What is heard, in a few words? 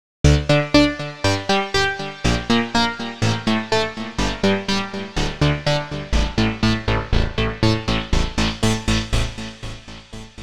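seq 8 sub osc and amp noise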